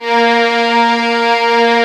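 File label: Vio legato A#2; Digital Sound Factory Virtuoso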